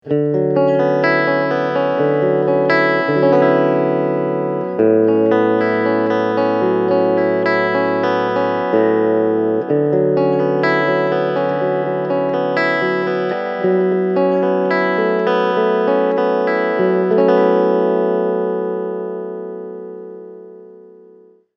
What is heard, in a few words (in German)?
Clean Modus